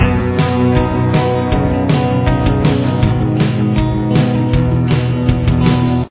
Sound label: pre-chorus, guitar